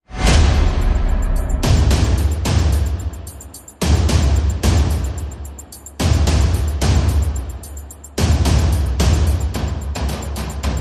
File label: Perc and FX Only